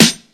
old school clear acoustic snare sound a sharp key, snare drum sample